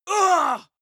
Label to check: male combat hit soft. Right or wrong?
Right